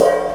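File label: crash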